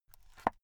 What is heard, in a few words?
watch case small close soft